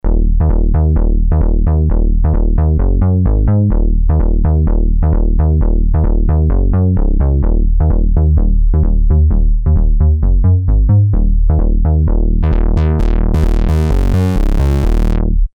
Moogie; BASS; sh-201

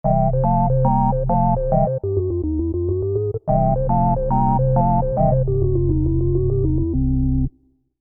JP8 Organ; V-Synth

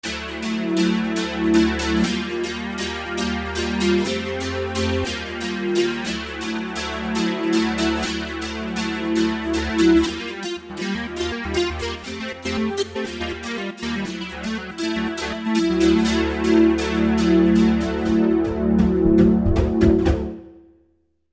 Fingr Add9th; V-Synth

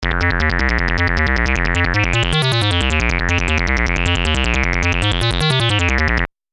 A synth bass with the TB Filter.; V-Synth

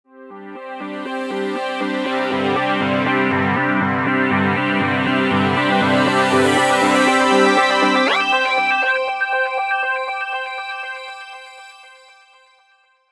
5th Pulsation, V-Synth

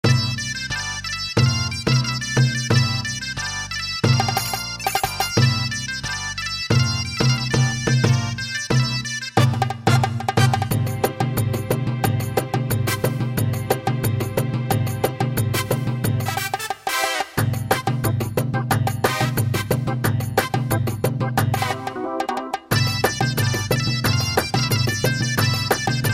KHEBETI C maj; gw-7

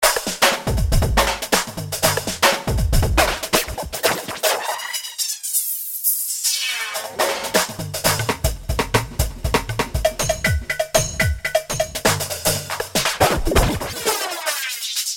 V-Synth

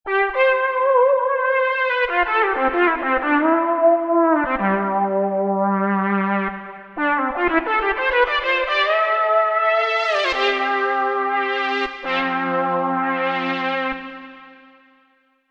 Solo Wah Saw; V-Synth

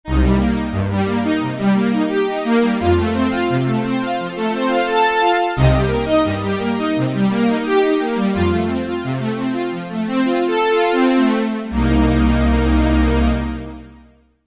JUNO Strings; V-Synth

